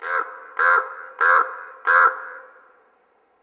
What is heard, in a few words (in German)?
crow leise; ottelo